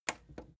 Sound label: plastic button small simple click slow